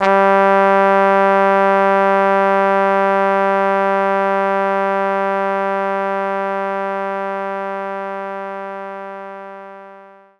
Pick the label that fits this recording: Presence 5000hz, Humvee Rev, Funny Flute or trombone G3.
trombone G3